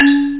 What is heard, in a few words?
2 BELL